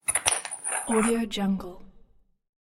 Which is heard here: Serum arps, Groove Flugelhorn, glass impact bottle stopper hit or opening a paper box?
glass impact bottle stopper hit